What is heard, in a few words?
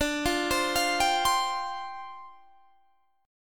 F Suspended 2nd D; Fsus2/D; arpeggiated